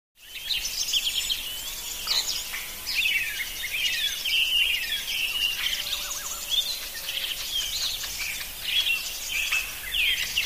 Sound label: Active Country Birds